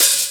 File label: OP Hihat; Open Hihats